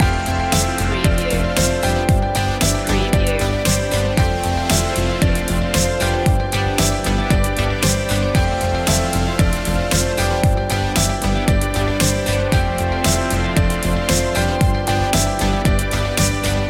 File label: streams of light loop